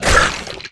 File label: pain, skeleton